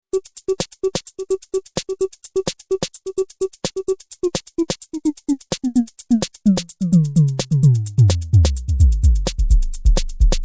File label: Kick Parameter; KickLancet